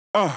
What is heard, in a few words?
Drum Loop 128BPM Vox AH; VEC5; Drumloops; Multi Loops